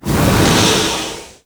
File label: Swarm Tear Spawn; Entrati